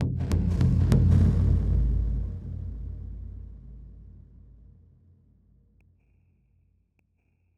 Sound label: Drum Hit